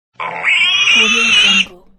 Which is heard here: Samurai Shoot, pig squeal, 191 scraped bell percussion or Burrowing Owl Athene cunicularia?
pig squeal